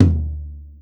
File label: Tom, Music; HapticSamples; Feel; NiceVibrations